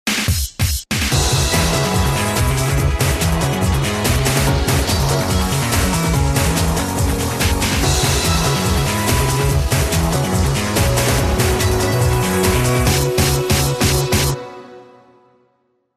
got weapon; Irregular Hunter X

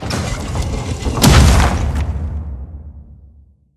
Great Dwarf Door

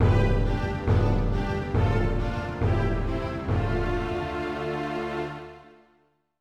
Level Failed; legend of spyro the eternal night 2007 ds

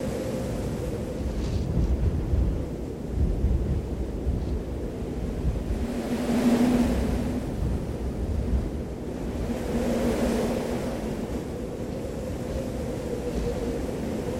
Wind; active life outdoor challenge

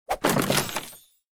Open Chest; mighty party 2017 gamerip pc ios android

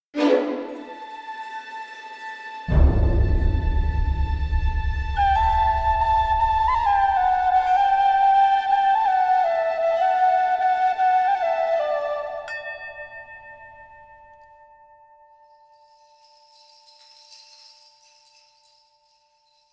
Flash Music Stem

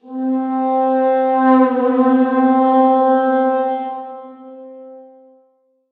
Fail Horn; Mason; chivalry medieval warfare 2012 pc

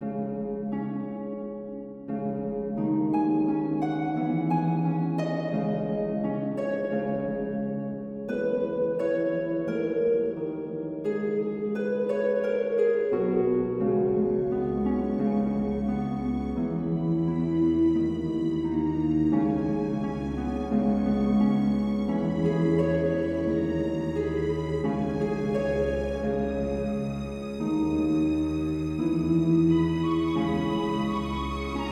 Dungeon; The Guild 2 Renaissance

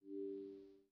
Global hint Hide; miside demo windows gamerip 2023

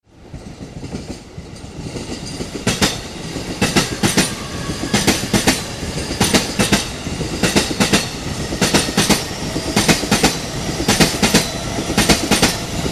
bgm train run; Shin Megami Tensei Imagine gamerip

